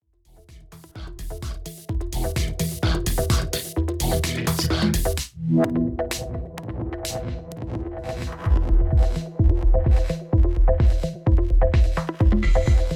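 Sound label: Denon DJ X1800 Reverb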